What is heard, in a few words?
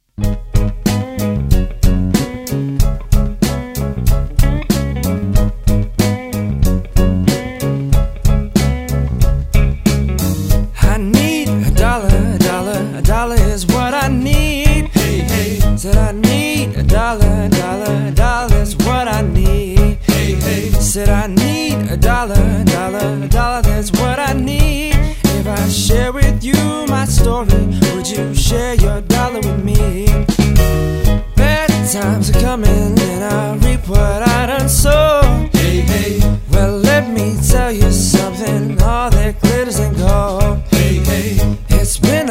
male vocals